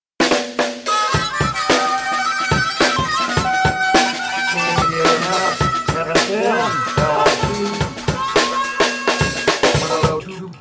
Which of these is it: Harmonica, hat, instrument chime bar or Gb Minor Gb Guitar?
Harmonica